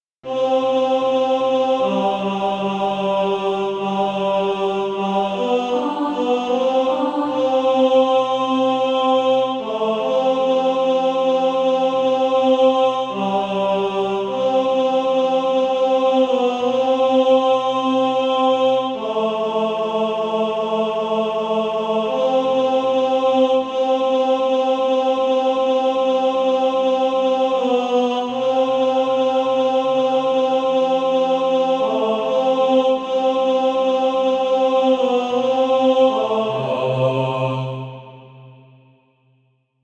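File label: tenor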